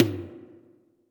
Toms, Elektron MachineDrum

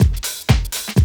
125 Loop

